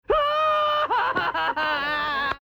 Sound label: wail